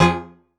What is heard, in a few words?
stab baroque F, Noise; stabs